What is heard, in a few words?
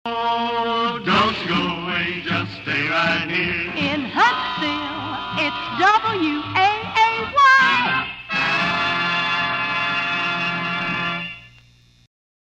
jingle for radio; waay